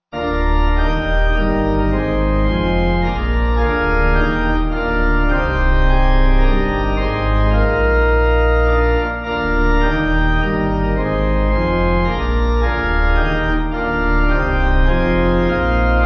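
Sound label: Pipe, hymn backing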